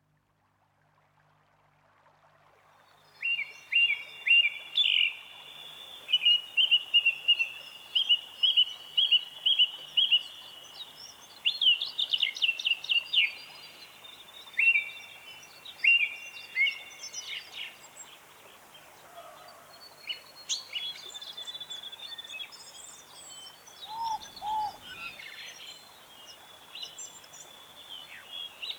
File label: thrush